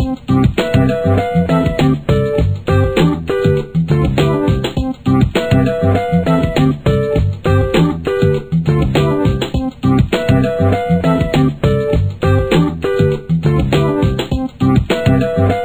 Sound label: polly pocket funk